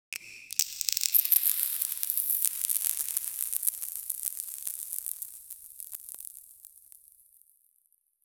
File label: sparkling treasure sound